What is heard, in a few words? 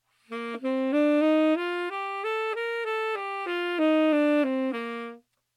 Bb major scale, saxophone